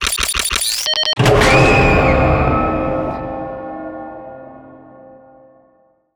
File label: quest status tick bonus complete halloween